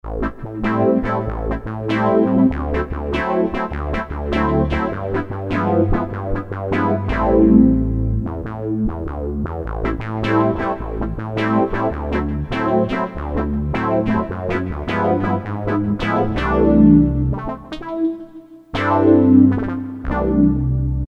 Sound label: Brass Res, Korg DSS-1